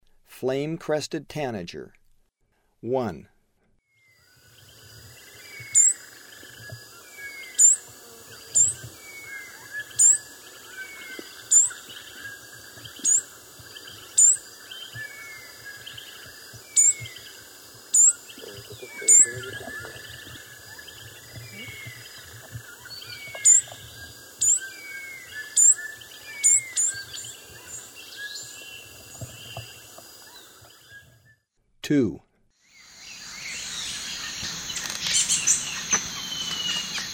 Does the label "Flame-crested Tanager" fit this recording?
Yes